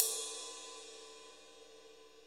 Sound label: Real Open, Hats